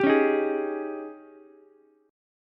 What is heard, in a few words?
G sharp Major 7th Suspended 4th, Ukulele; G#M7sus4 strummed; JGuitar